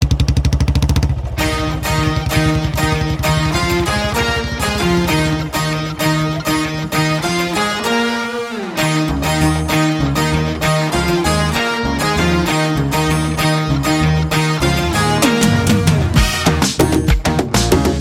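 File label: background music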